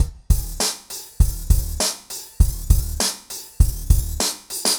Remixed Drums 100BPM; The Roots Drumloops

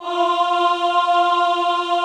AHH F3, Extended Classical Choir; Best Service

